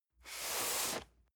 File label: cardboard box medium slide; objekte